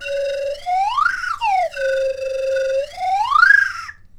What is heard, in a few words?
Flutter Wander, Slide Whistle